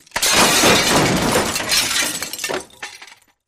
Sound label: Glass Metal Crashes; windows & mirrors